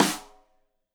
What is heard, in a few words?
Rim Shot Stereo; Deeply Sampled Drums; SMDrum